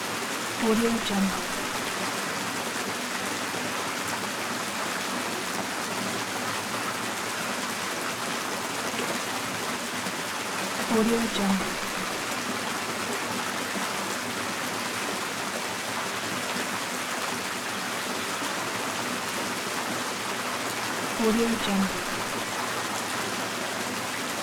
millwheel water splashing; lokohighman

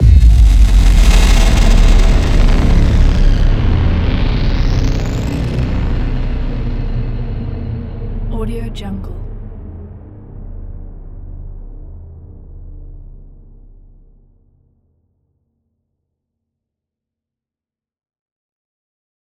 massive impact crash

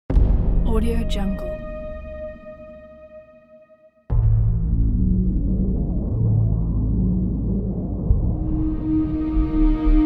epic teaser ident

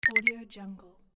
notification

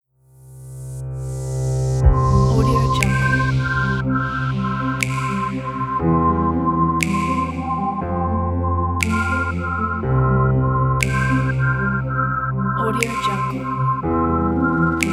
light inspiring electronica

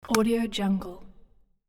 gun bullet insert; hollywoodedge

